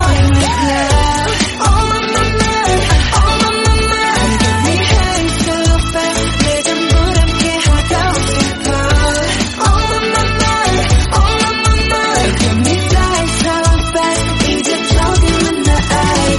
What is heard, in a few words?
caller tune